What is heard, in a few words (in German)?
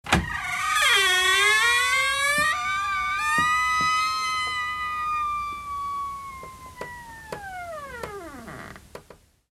Tuer